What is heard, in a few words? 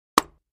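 2x4 Smashed Sledge Hammer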